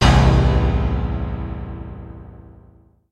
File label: Piano Hit Dark